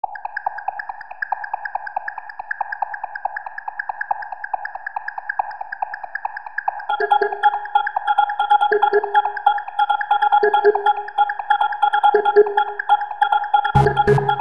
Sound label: Comic Melody